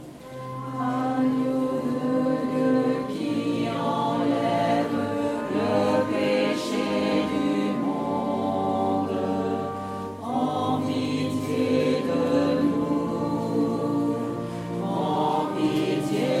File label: Tutti; Agneau de D Messe st Claude